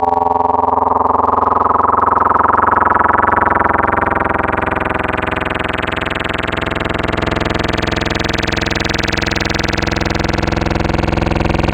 Growl, Wavetables

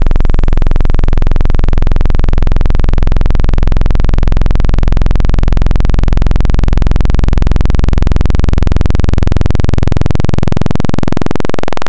Analog PWM Saw, wavetable

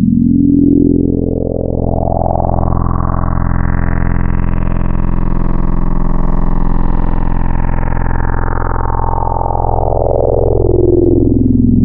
FM, Wavetables

